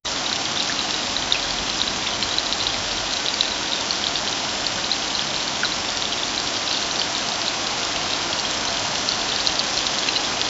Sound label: light drizzle to heavy do, rain